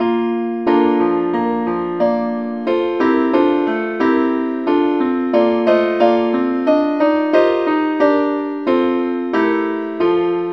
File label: keyboard only key bes; saintthomas